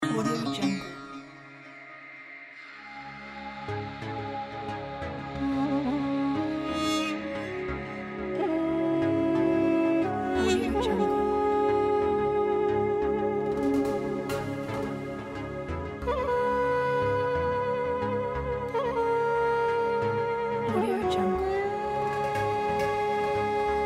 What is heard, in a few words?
duduk sings in the mountains ident